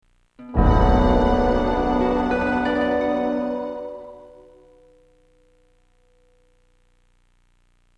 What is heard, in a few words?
awe chord; Indiana Jones and the Infernal Machine